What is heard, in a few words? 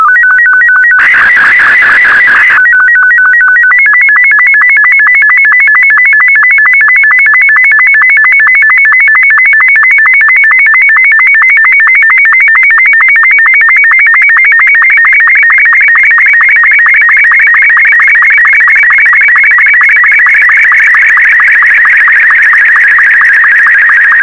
sstv; VK4MSL